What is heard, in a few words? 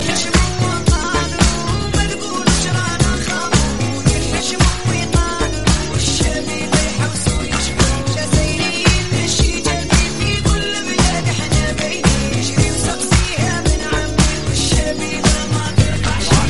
remix rai funky